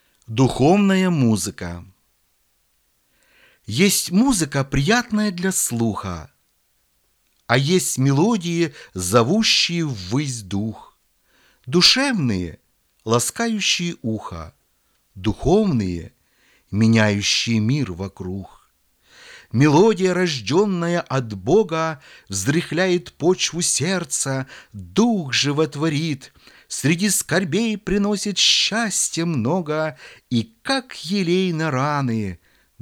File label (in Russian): духовная музыка